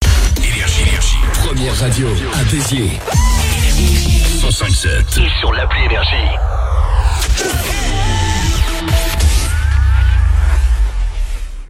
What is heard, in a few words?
JINGLE